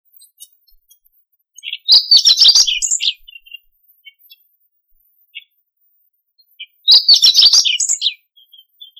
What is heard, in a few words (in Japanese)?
hooaka